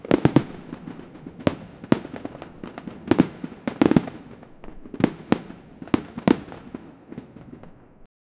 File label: fireworks and f1 engine; motorsport